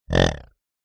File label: pig grunt designed; animals